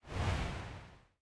slide; Smite; god Medusa v2

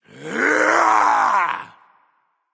battle cry; smite

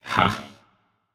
notice; smite; achilles

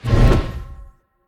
atlas swing; smite; god atlas

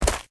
footsteps; smite; god merlin

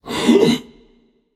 breath; cernunnos

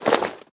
armor heavy; smite; chiron

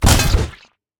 zombie bodyfall; smite; ahpuch skin10

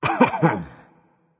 clear throat berserker; cuchulainn

